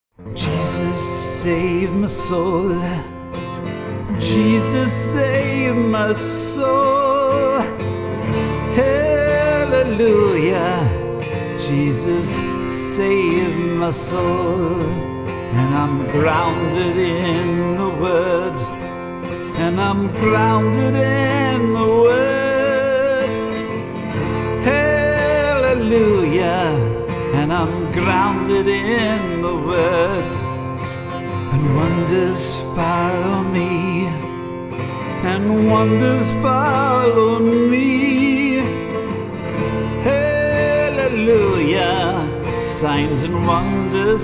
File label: acoustic